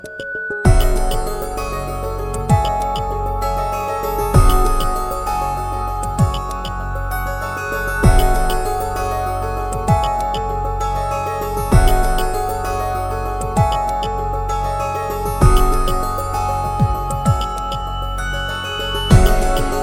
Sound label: calm tone